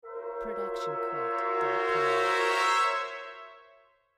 brass dissonant cresc

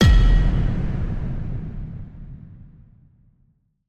Epic Metal Hit; soundscrate

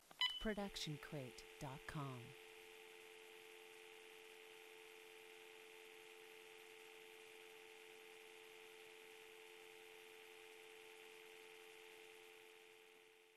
Phone Beep to Dial Tone

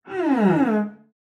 Cello Slide Down; SoundsCrate

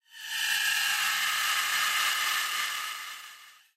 Screamy Screetchy; soundscrate

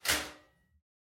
Metal Footfall; soundscrate

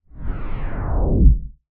scifi power down

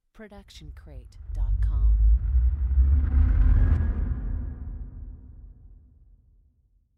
tense entering buildup; soundscrate

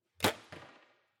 archery foley release and impact

